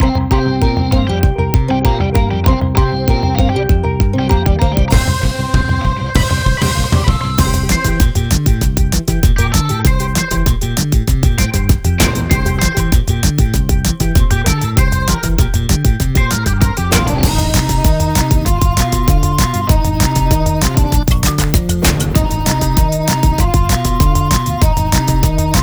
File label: Intense Racer; big beach sports 2 wii